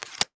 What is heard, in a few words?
sticker apply; cs go september 10th patch